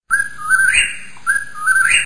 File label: birdsong